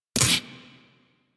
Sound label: Shotgun Alt Mech; Weapons; Revenant; Warframe; Tenno